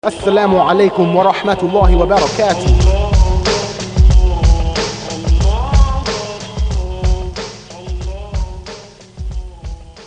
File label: islamic rap salam